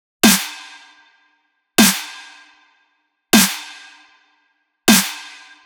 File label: Deathstep 155bpm Snare; EDM Drums; Ghosthack; Drum Mega Bundle Demo; Ultimate EDM Drums; Deathstep Kit 20